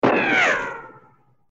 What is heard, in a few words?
ricochet, weapons